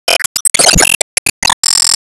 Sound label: Enemy Healer Cast; disney star smash android ios mobile gamerip 2020